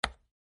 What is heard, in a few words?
chess warning